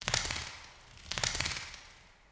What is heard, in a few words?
bat flaps; ambient soundscapes; diretide batflaps; dota